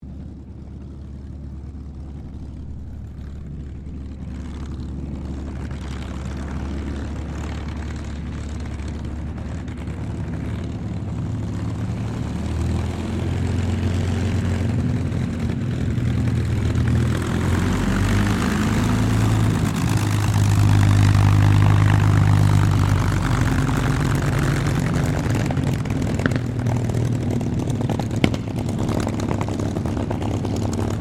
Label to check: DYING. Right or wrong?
Wrong